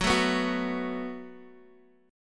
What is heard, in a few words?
F sharp Minor Double Flat 5th, Guitar Open D; chordshape; down